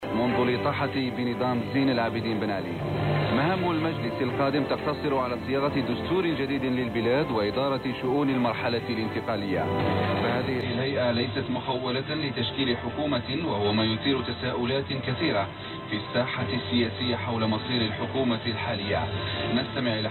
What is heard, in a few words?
531khz; Algier; RK3